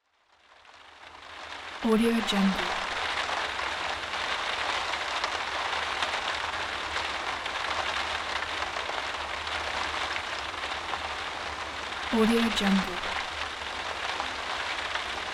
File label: rain on window